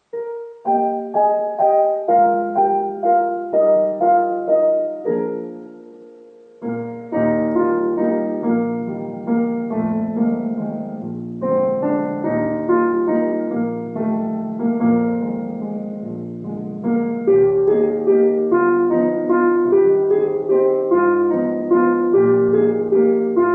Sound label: piano background